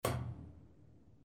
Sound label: Switch Off